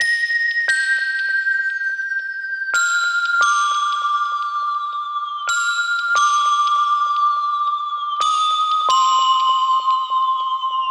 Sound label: Melody Loop 88 A-m